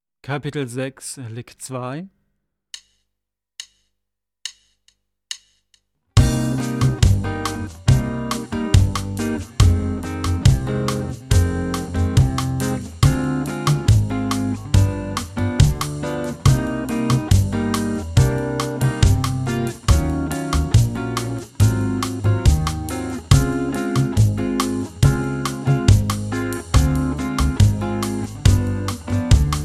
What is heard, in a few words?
Latin Style Guitar